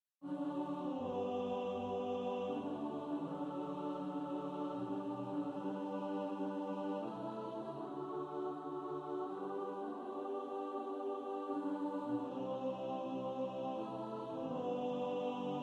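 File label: alto, SATB, Practice Music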